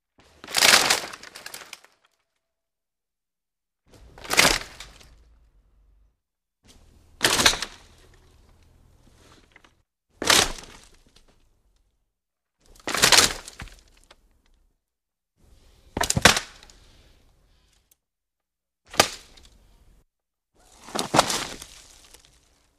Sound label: Branches Broken, trees